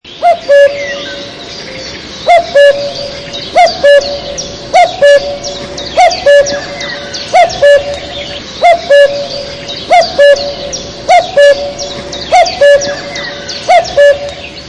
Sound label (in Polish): Ptaki - Kukułka